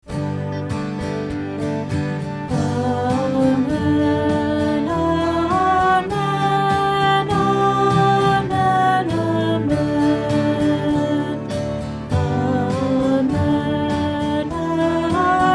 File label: Amen